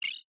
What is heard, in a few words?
science fiction control panel button beep